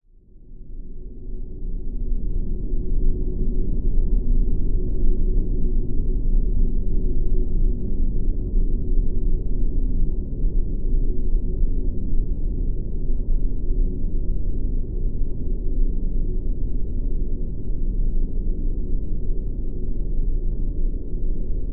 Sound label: horror dungeon amb cold eerie dark